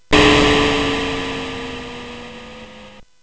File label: Synth Sample, CSG8580R5; hvsc